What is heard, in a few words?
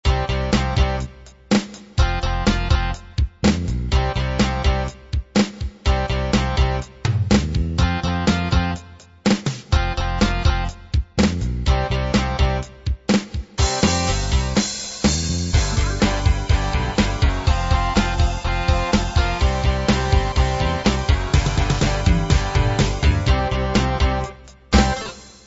open rock